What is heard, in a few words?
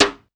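SD -L, snare drum, Drum & Bass; Ueberschall; Drum & Bass Resonance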